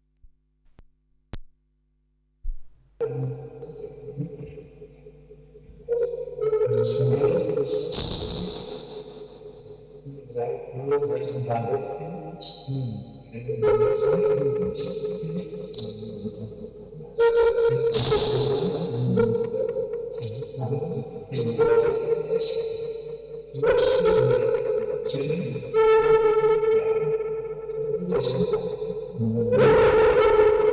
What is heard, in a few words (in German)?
Aufnahme (Tape 19 cm); Spieluhren-Quartett mit Tonband, Vocoder und Fieldrecording